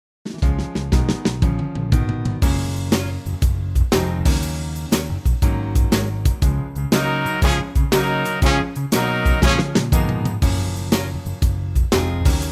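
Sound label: soul family D flat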